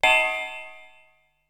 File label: Triangle Loop, Ensoniq SQ-R-Plus